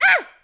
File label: girly die; female characters; AoM-ARTofMyth